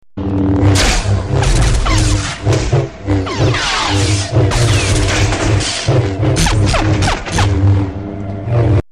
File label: lightsaber fight